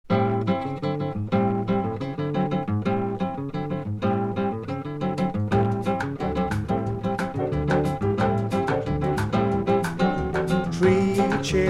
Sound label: Bossa Nova